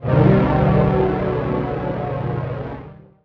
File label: Synth Bend B